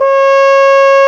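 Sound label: C#5, mute trumpet; AKAI S-Series CD-ROM Sound Library VOL-2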